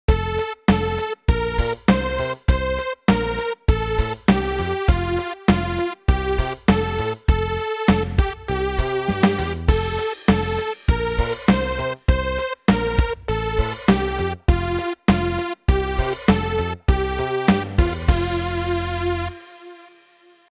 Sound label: ode an die freude bassline